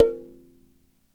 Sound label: Dyna Sansa; Roland LCDP11 Africa VOL-1; Sansas